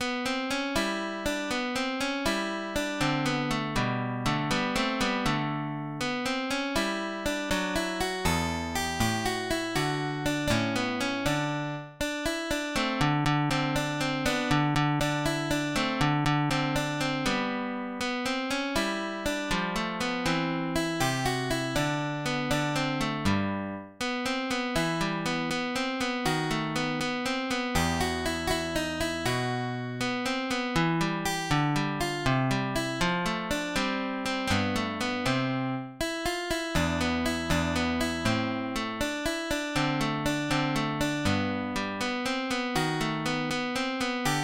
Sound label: Rondo Allegretto, guitar